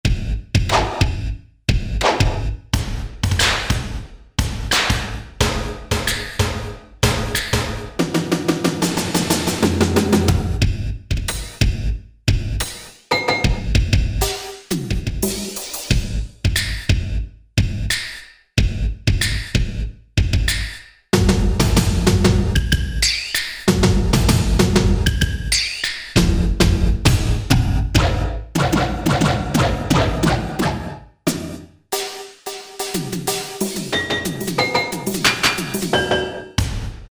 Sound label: Mondo Kit, Korg MSC-3S Drums